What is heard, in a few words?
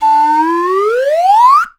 SLIDE W, SLIDE WHISTL